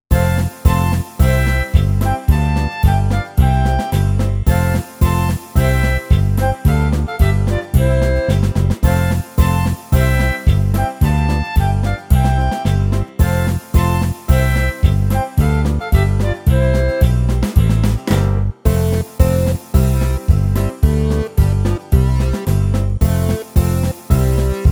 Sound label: Karaoke